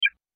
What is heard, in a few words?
Chirps, notifications; Tecno Phantom Pad II (G9)